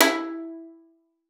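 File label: violin pizzicato E4; Xsample VOL-5; 90 s Sample CDs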